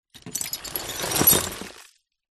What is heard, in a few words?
broken glass pieces in cardboard box tip side glass slide; zapsplat